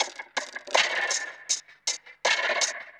Drum Loops Processed; Zero-G Smoke

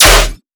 bass oneshot gun D

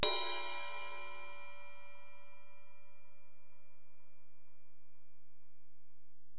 Ride, Alesis DM-PRO, Drum Machines

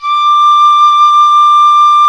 FLT PICOL, Flutes, Picolo& Flt; Club-50 - Foundations Roland

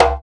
LAMB, African Perc; Spectrasonics; Supreme Beats; African Contemporary; Afro DRMs

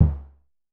Drum X Kick, Pearl Drum-X

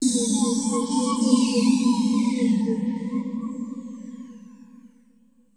sfx sci fi; Masterbits - Switch FX

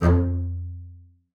F2, bass spicc; Xsample VOL-5

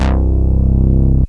BR BASS; Masterbits CLIMAX 10 - Add Lips Vocals

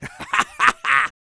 A YELL, MALE HUMAN; R&B & Dance; ILIO; Vocal Planet

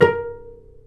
PIZZ CELLI; E-MU Formula 4000 Series Vol. 5; Protozoa; Proteus2 Instrmt

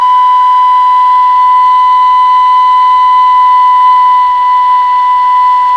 KHLUI B4, KHLUI FLUTE; Spectrasonics; Heart of Asia

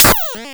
Tin Foil Snare, drum machine; 300 Drum Machines; DevSnd

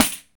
PAN, ETHNIC PERC; Roland LA

